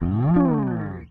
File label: P bass; Sampleheads; Will Lee Bass Library; PBASS EXTRA